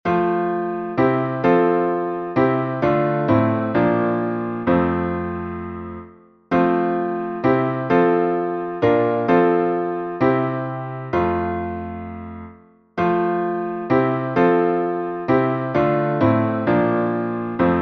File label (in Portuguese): salmo instrumental, Saltério de Genebra, Modo: jônio